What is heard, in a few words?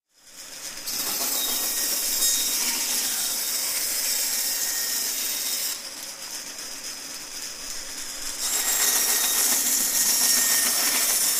Carpentry Machine Wor; construction small